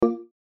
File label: BUTTON BUMP; Multimedia; Buttons